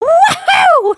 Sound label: dance; emotes